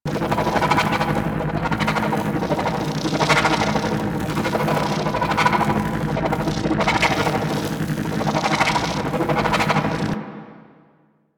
Arch Gun Primary Beam Loop, Weapons; Warframe; Archwing; Hildryn

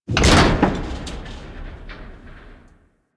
Ship Hull Impact Medium; Hit Effects; Warframe; Railjack Hull Hit Effects

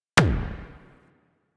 Arch Gun Primary Beam Punch 3D, Weapons; Warframe; Archwing; Hildryn

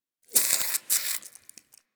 Wheeze Close; Warframe; Infested Eris

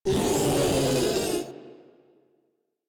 Vocal Attack; Warframe; Proto Infested Ancient

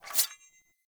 Sword Accent Blade; Weapons; Player; Swords; Garren Sword

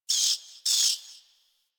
Night Zone Tree Critters, Ambience Plains; Warframe; Eidolon Plains; One Offs

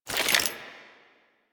Generic Foley; Warframe; Dagath

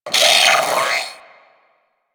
Sentient Brachiolyst Pain; Enemies; Barks

